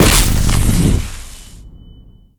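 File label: big bow, attack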